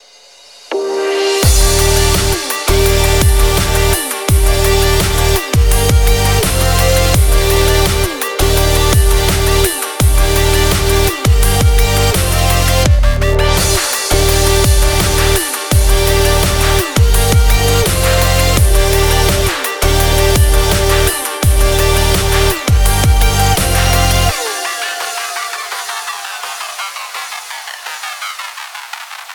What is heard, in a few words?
high tech robotics